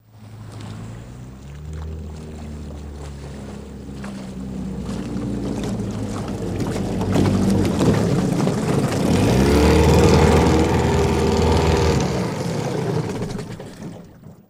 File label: 50 Hp Motorboat Drive